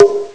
bongo; Amiga Soundtracker Sample Packs

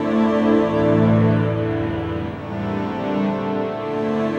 Acoustic Drone, Short Loops; Sonic Foundry (Sony Creative Software) - Friendly Fire; DroneLoop

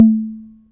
volca beats tom; Korg Volca Beats

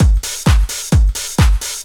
straight, Techno Trance Essentials drum loops

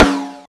processed snare, Deep House; Zero-G Deep House; single hits

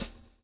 SWNG

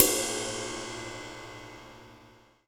XV Ride, Roland XV-5080

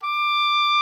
OBOE D6, REEDS; Greytsounds VOL-1

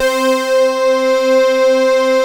JUNO 106, PADS; Masterbits; Vintage Voltage

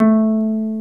HARP; Greytsounds; HARP+STEAMER

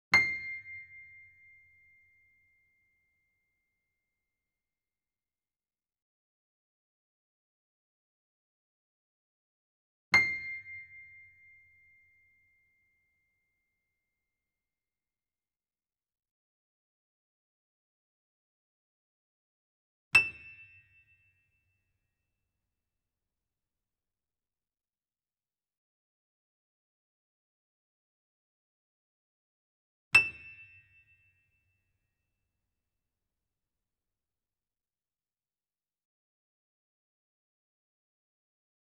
C7 E7 A7 Grand Piano & Sample Piano; KORG; Sound Sampling Collection VOL-3; Grand Piano 88

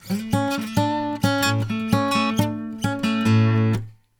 Blues Guitar; ILIO; Fretworks; LICK

